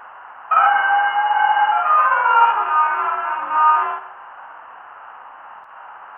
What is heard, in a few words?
sax (radiosax) F; Sonic Foundry (Sony Creative Software); Ugly Remnants VOL-2